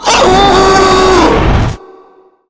charger charge; shadows

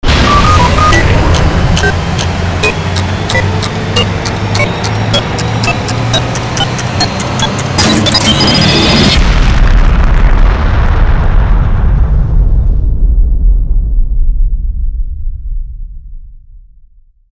megabuster explode

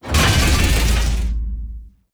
armor regenerated; baron

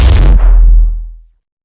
laser turret, weapons

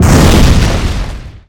mercmortar explode

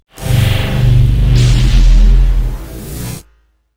axe deploy; argent

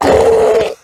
brute dead; trespasser

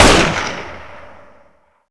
ak shoot

